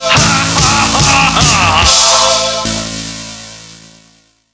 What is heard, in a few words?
har har har har; svencoop